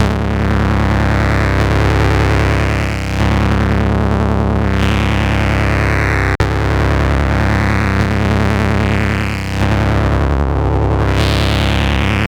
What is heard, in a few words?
Acid Drone